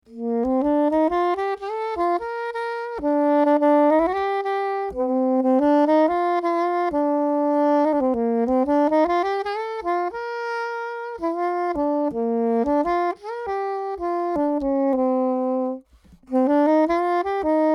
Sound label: sax dpa4099 test